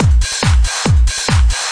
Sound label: 140 bpm, ultra basic techno, drum loops and breaks